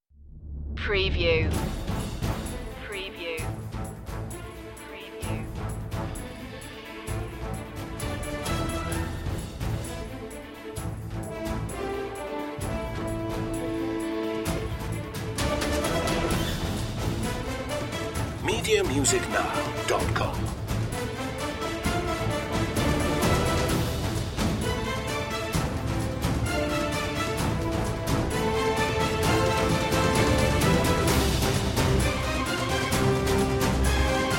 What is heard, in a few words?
battle of the souls no choir action part